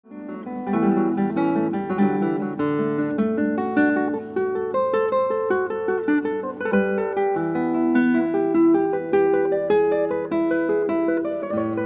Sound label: Guitar